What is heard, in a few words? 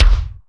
Pitch Tail Kick